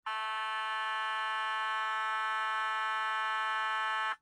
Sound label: Airhorn; 911 Signal